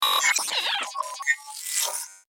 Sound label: Notification; Monarch